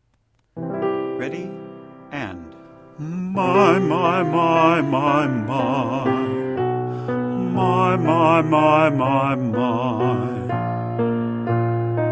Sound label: Descending Low Range Mai